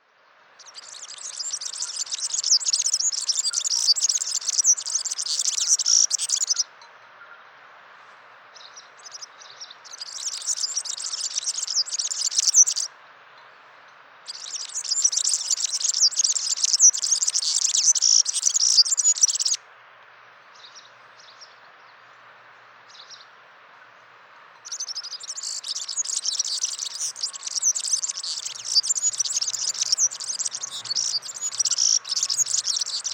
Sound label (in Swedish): gulhampling; fagelljud